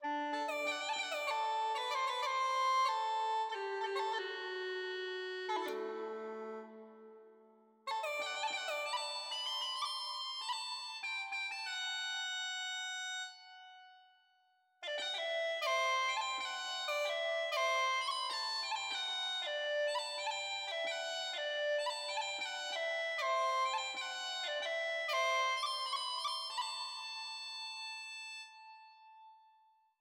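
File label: Theme C, uilleann pipes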